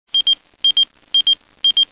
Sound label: pager